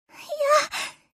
section fail; alfie dt fancy

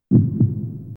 heartbeat; Kill La Kill

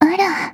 match leave; [HD] Seoul v11 Asta Edit

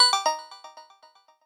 section fail; saiyku [rby] instafade

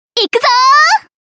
comboburst; Comboburst Sounds

